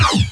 armi

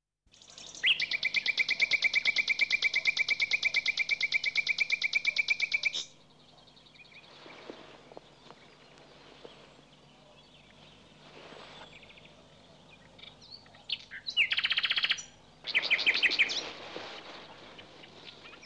uccelli birds, animali

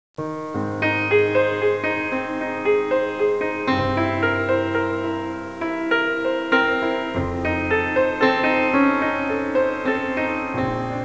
suonerie classica; musica classical

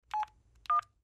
suonerie telefoni fax; phone

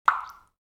acqua water, natura

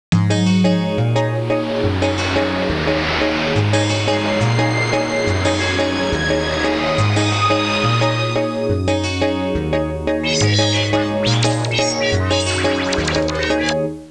musica elettronica; Suoneria

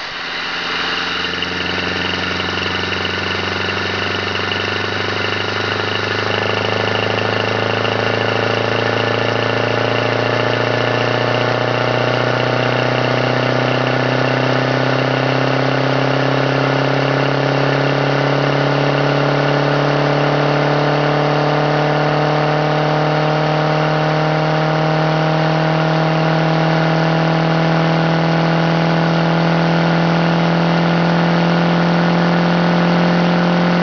Aspirazione, auto; trasporti

